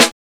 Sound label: Snr